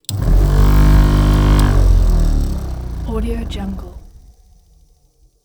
motors polishing machine various; Robotic Lifeforms 2

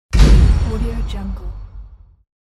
trailer hit

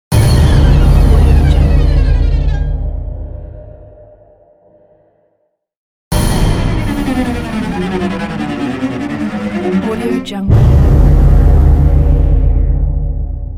horror tension thriller pack; kipaloops

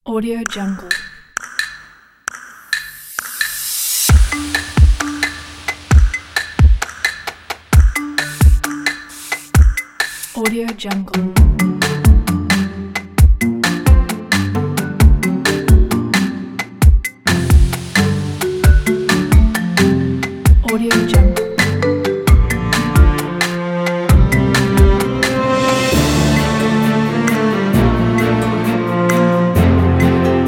inspiring quirky percussive motivational trailer

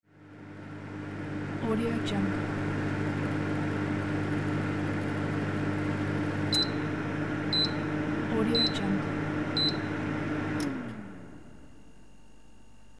microwave finished

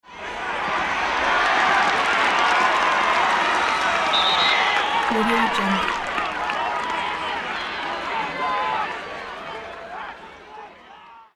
sports game crowd cheer and referee whistles

